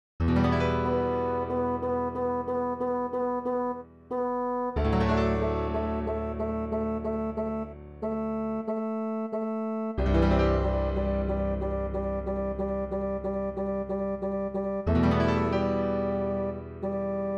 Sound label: doxology and amen spanish midi